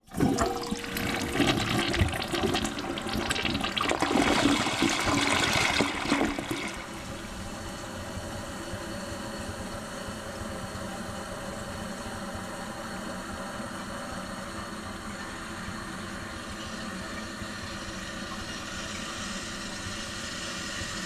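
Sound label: dynamic flush dynamic aquatic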